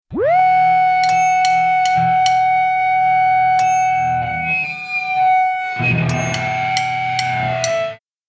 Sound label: Guitar Feedback